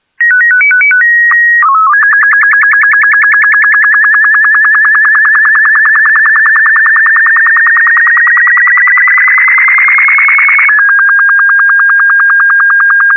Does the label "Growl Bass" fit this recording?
No